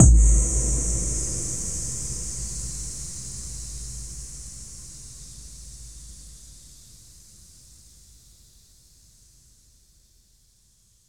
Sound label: Boom; Zero-G - Future Wave; Drums; Xtra